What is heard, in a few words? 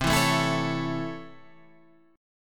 C sharp Minor Major 7th Sharp 5th, chord